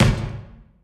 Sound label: Menu Basketball; Menu FX